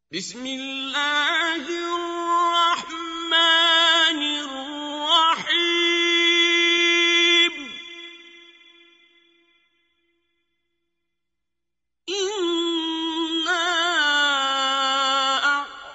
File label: sure kosar telavat; quran; abdulbasit